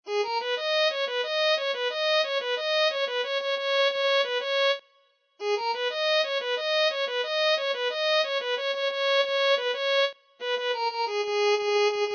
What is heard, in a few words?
Violin